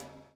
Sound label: SLICK, Backbeat